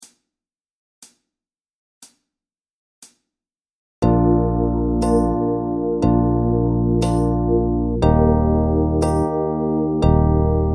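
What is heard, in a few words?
Drum Groove Slow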